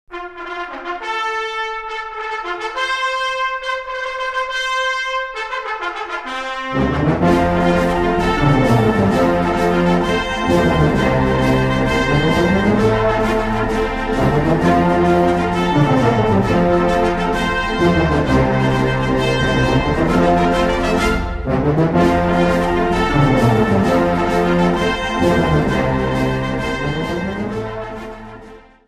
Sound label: basses; lehigh